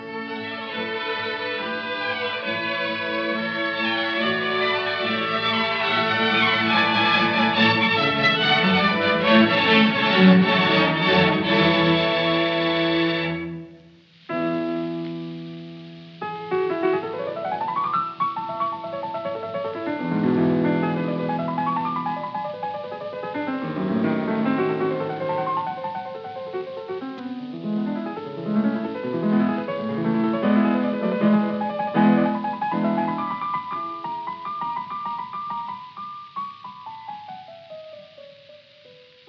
Piano Concerto; Mozart Piano Concerto No 12 in A K.414 1st mvt Louis Kentner

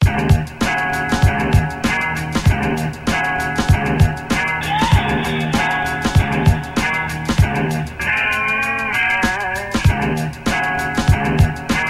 beat

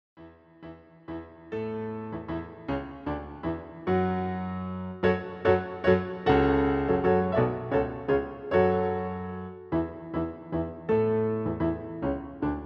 German Dances and Waltzes; Schubert

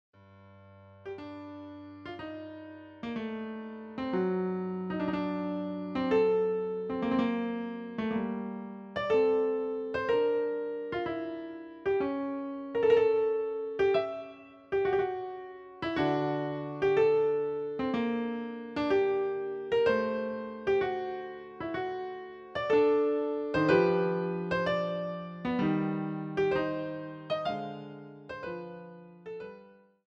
Prelude and Fugue in G Minor; WTC Book 2; J.S.Bach

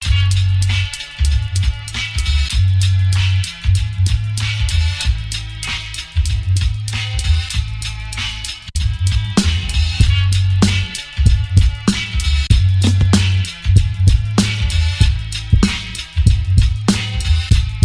beat

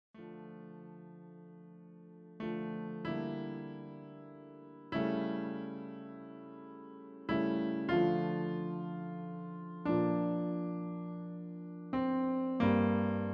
Chaconne in D minor; BWV 1004; from Partita in D minor

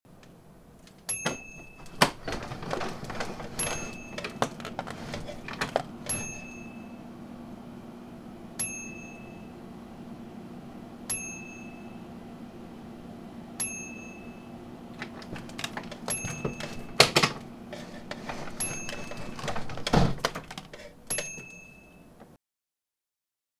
Opening and Closing a Window

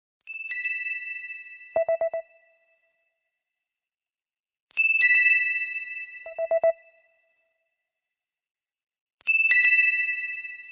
Simple Tone Ringtone; Ringtone Records

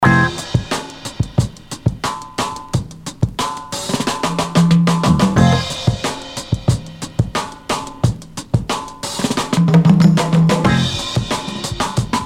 HIP HOP BREAKS

